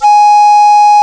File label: Recorder C4